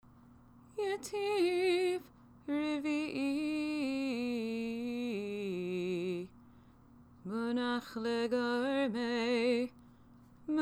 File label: yetiv revi i munach legarmeh munach revi i